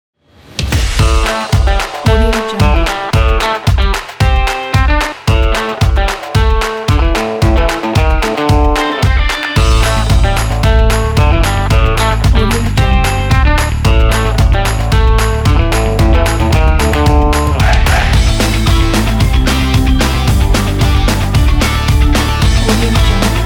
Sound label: Energetic Funky Rock